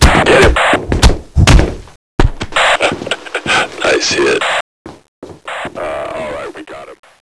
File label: boo